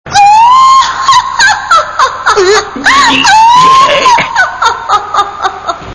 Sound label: laugh; Slayers; Naga